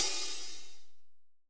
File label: AMB MDHH, Drum Kit, AMBIENCE; AKAI S6000 CD-ROM - Volume 3; AMBIENCE KIT2